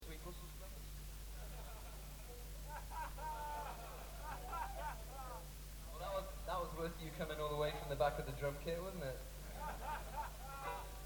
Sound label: Instrumental